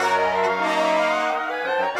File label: Brass Winds, Orchestral; Sonic Foundry (Sony Creative Software); Orchestral VOL-2; Modern 16